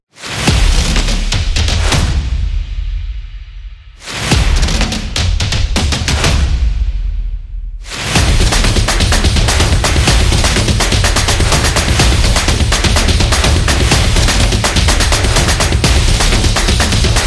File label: Fast